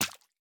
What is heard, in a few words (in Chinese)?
蝌蚪受伤声